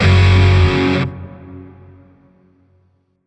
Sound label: Shawzin Chromatic Chord D; Music; Warframe; Grineer; Chords